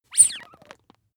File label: bath duck squeeze hard